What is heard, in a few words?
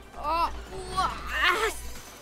dying